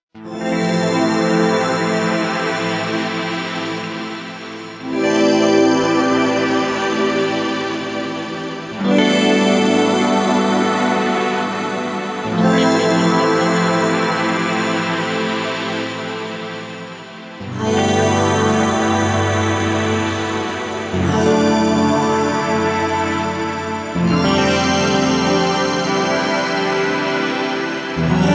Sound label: Atmospheric